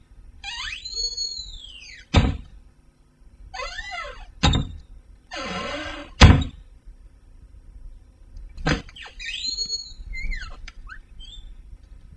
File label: cabinet